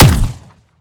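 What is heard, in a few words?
weapon player; romeo870; gmod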